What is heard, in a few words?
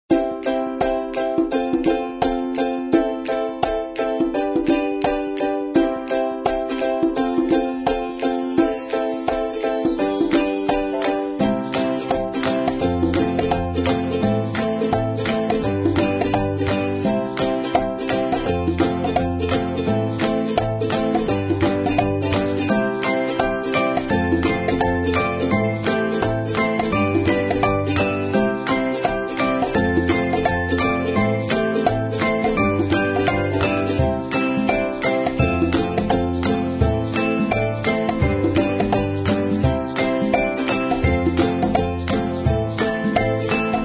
Happy Time Light Ukulele version